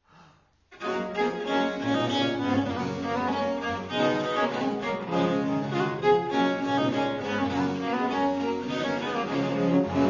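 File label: G major gavotte en rondeau; Marais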